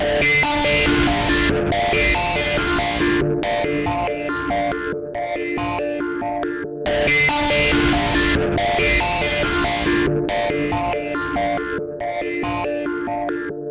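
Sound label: 140 d guitar loop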